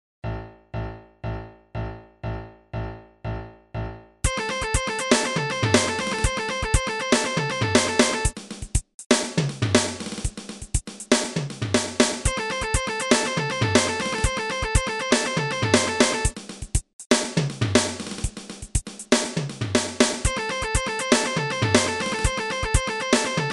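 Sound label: Guitar riff, 120 bpm; speedpick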